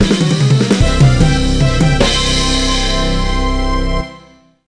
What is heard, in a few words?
midi WIN; bully scholarship edition; Arc Race MX